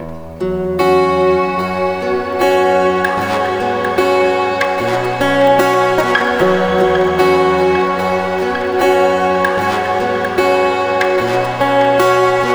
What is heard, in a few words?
guitar loop B Maj 150 bpm; Young; base pack